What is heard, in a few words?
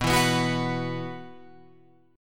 B Suspended 4th, Guitar, Open F; 11,11,11,13,14,13; strummed; JGuitar